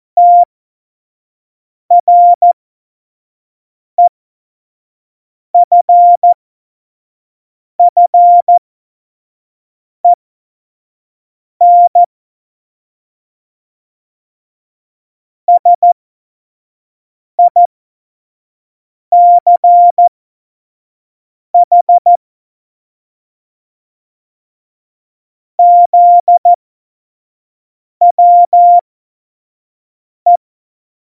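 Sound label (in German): Witz, Morsekurs, cw morsen; Tempo 25 F70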